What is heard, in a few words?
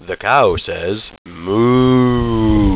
COW